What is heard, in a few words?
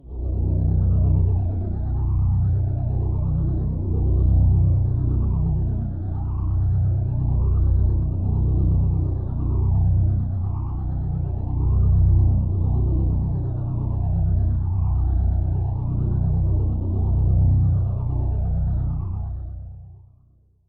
Postapocalyptic Drone